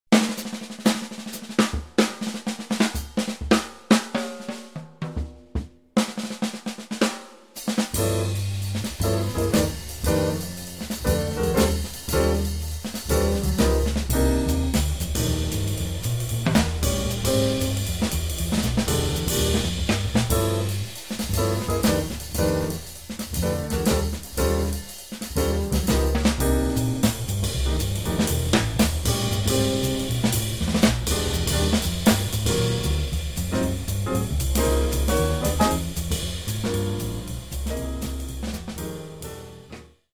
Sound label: minus Melody